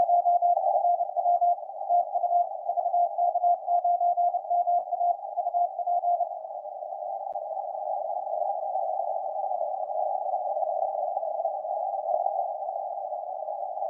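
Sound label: 160m, signals on 160m band; vk9ma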